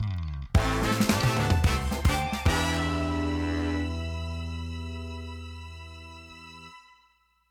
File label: Success Reveal; Act It Out A Game of Charades; PS4; Wii U